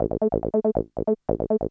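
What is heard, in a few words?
synth electro 140 A; Techno; Keys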